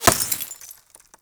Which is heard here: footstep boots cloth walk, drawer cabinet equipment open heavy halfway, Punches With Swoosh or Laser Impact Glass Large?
Laser Impact Glass Large